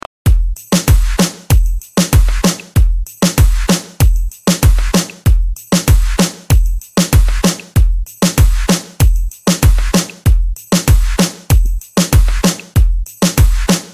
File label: Dj Intro Latino Hook; Redrum